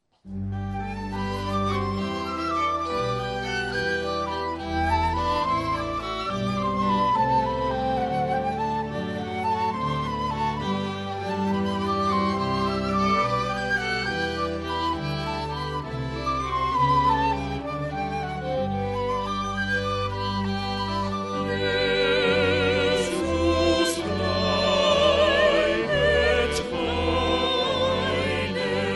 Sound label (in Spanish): coral, trio; Coral Cantata 147 - Bach2